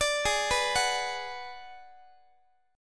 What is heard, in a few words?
G sharp Minor 7th Flat 5th, Guitar; arpeggiated; x,x,17,18,15,17; 4A,4E,5A,5D,5Gsharp,6Csharp; G#m7b5